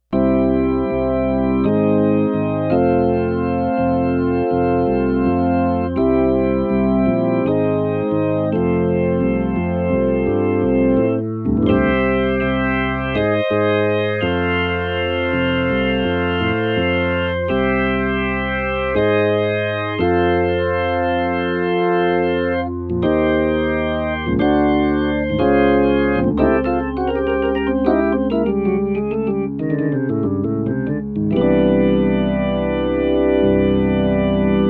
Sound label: drawbar organ